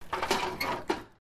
bucket

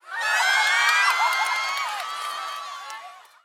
people performance "uwaa"

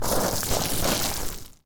sliding

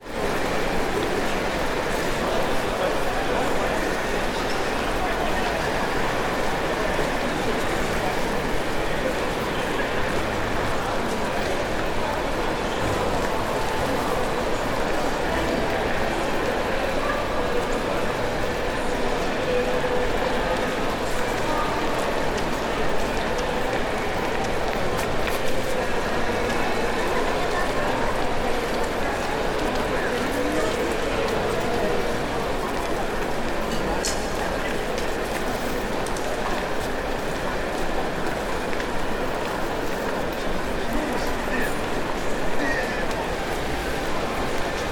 eventhall

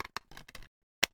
dvd case close

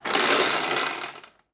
ship big rudder

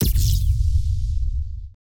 booster

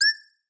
cursor, button

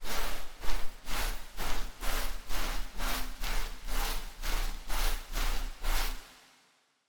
bath wash

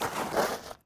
step into

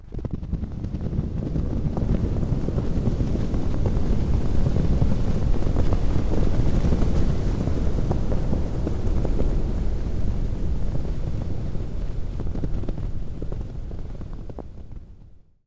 avalanche

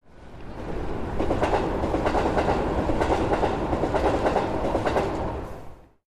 train pass

